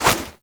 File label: Inf Punch Whoosh B; Warframe; Weapons; Infested; Inf Kick Punch